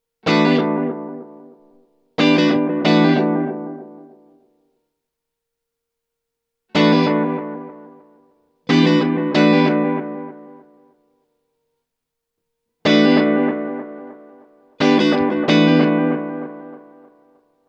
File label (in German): Tonregler Check